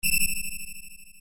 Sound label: ting; ff3us